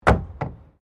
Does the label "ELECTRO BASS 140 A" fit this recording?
No